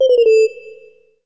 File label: Warning